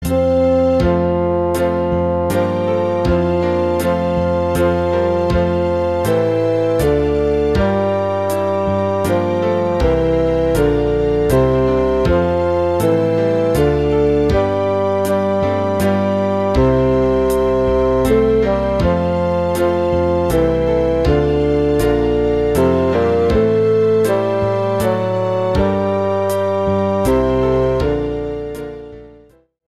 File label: away in a manger bass, backing tracks